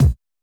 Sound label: kick, Roland MC-909